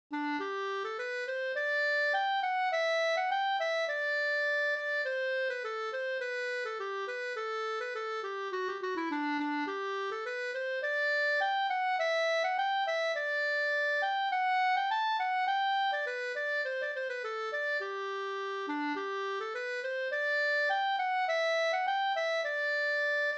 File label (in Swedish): ganglat